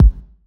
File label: Kick, Kickdrum; Equipped Music; Loungin House